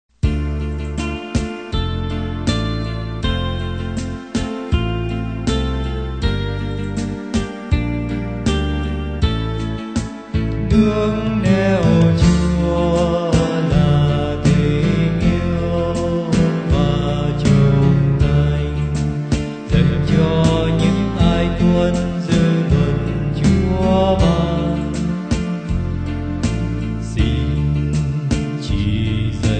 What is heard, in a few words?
bass